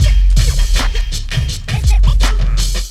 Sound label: triphop, audioloops